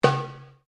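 Drum Darabuka Edge Slap forte, Egyptian Darabuka Drum; Commanly available Egyptian Darabuka Drum; Musical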